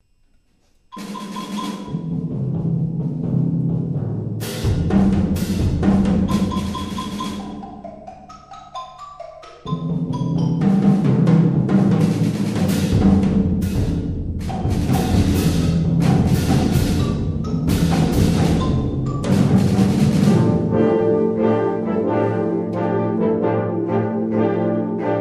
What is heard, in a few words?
Cantus Firmus